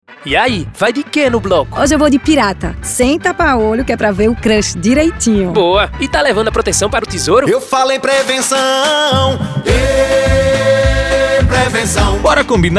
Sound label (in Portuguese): spot frevo carnaval